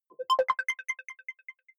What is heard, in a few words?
button echo distorted high arpeggio; maschinen; signale; soundpool